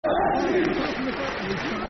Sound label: Football Chants (Soccer Songs); Emile Heskey; Wigan Wafc; fanchants